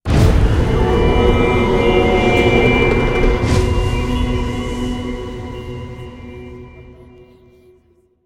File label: main game to free spin; rise of maya